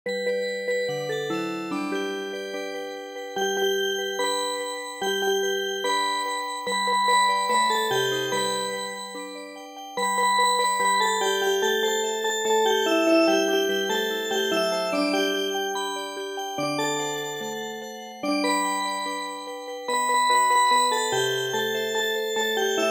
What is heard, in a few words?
Music Box, midi